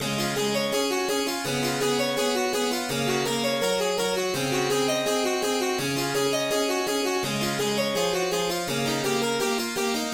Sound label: in C Sharp Major; BWV 872